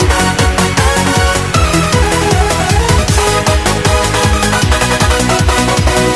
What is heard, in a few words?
rave